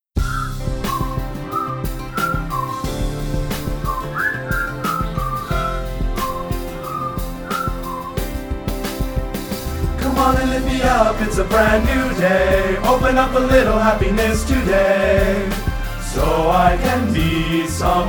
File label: COKE OPEN BASS